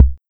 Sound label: Kick Rhythm77